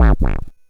Drums, Hits; DCM8

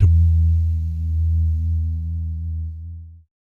BS DUMM, Vocal Planet Jazz & FX; ILIO; BASS DUMMS

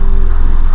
Bass Extract, wavetables; Animoog

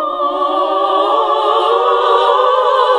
SING, CLASSIC VOX1; Best Service; Hallelujah

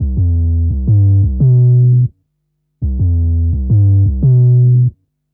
Hammer Bass, Drum & Bass; Fluid Dynamics

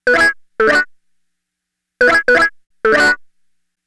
Guitar Hits; Sonic Foundry (Sony Creative Software); Stylus Pressure Urban Grooves on Digital Wax; Wiggle Disc

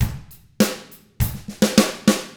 ROCK, Drums; ILIO; Double Platinum Drums 1

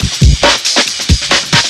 FXBEAT; Big Beat Drumloops; FX BEATS; AKAI

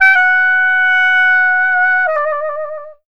SAX, Balkanish Traditions

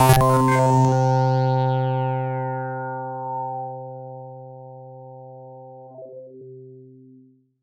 pad C3, PPG Wave 2.2; Pads

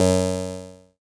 ELKA, String Machines; ELKA RHAP

